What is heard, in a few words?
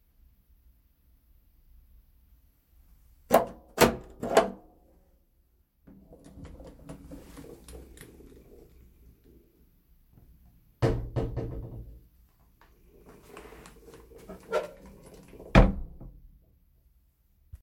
WC door being opened and closing